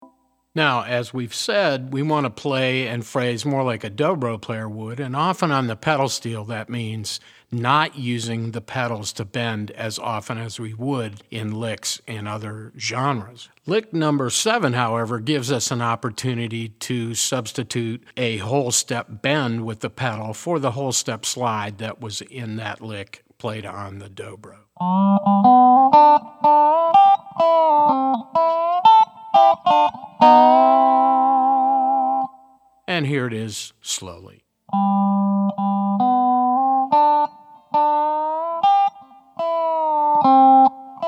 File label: resonator pedal, steel guitar